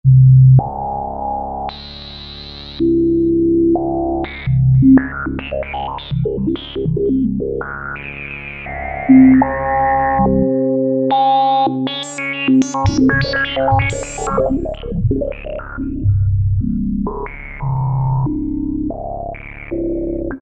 S+H DRONE; Akai SG01v

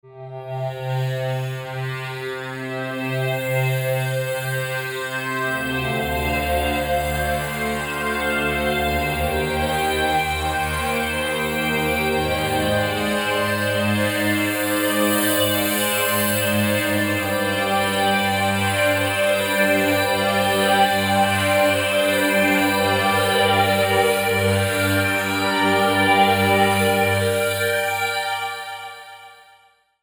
Pressure Glass

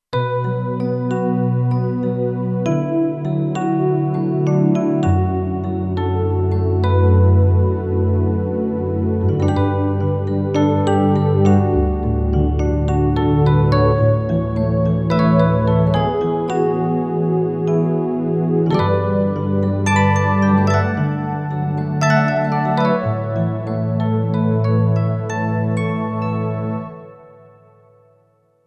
Ballad Comp, Kurzweil K2500X

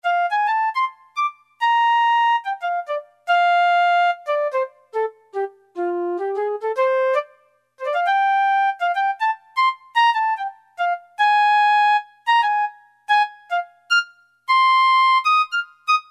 Flute, Roland D-110; Factory Patches

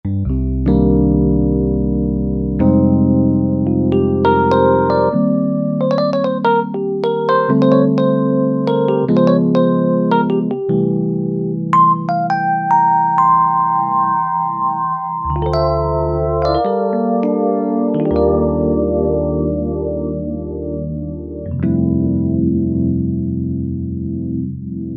Suitcase

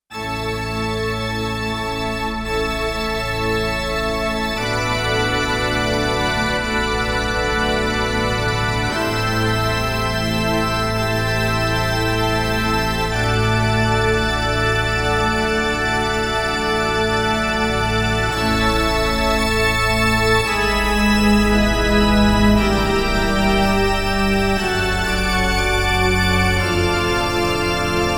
Pipe Organ, Roland JV-880; Factory Patches

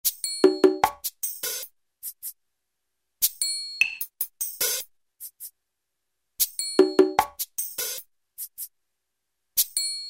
New Age, Alesis SR-16, Preset Patterns

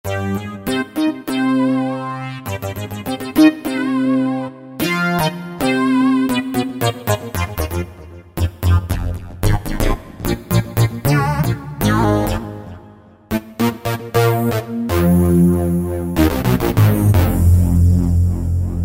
Hi-Lo Synth, Roland V-Synth; Factory Patches

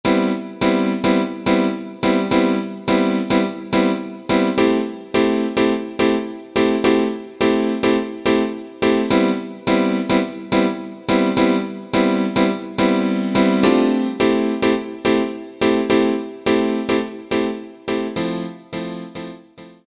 PIANO; Best Service Alpha Dance II

